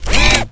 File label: chamber close; weapons; linkgun